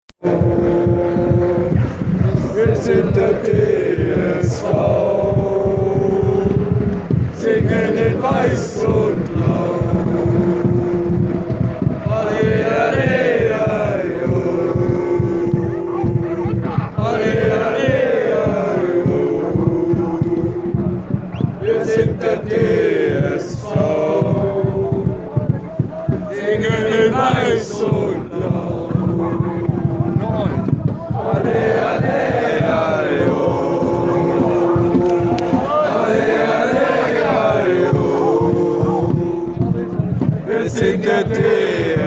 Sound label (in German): Fangesang